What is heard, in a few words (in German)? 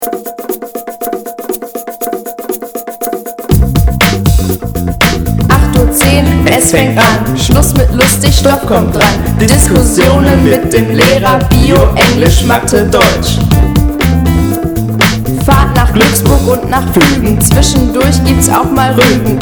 Jingle Projekt; steinhundert